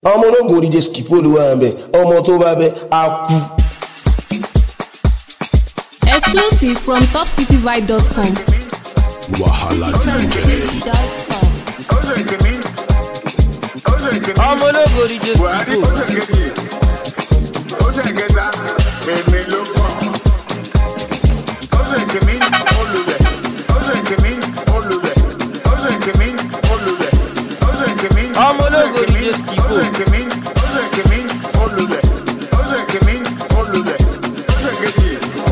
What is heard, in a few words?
Amapiano Refix